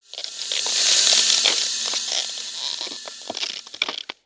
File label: Ice Crackle